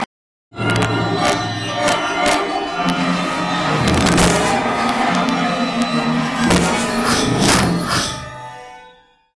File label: red alert